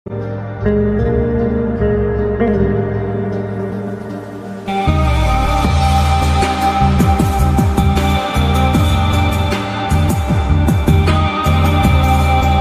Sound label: turkish bgm ringtone